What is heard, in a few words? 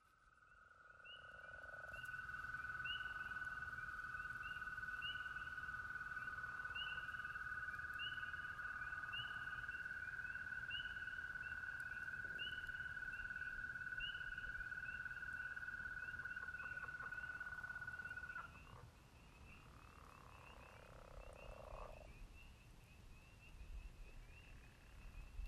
American Toads Spring Peepers Southern Leopard Frogs and Pickerel Frogs; Cloudland First Day of Sprin